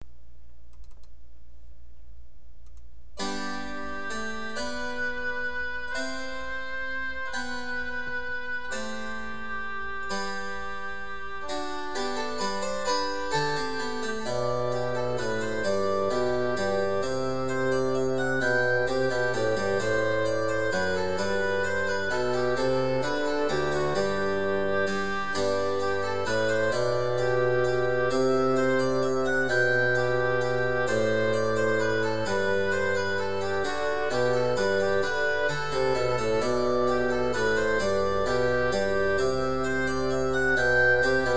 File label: Minuet in G; Grade 10 Class Exam Solos